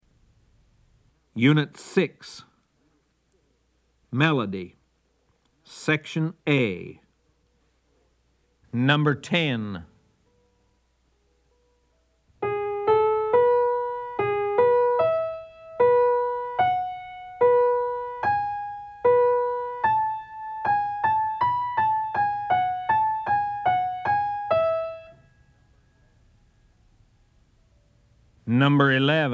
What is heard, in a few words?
melody, ear training recordings